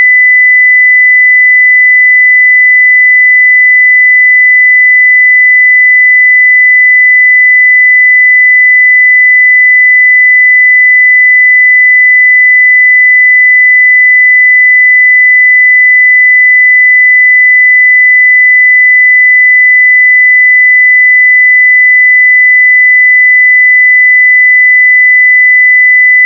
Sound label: sine 2K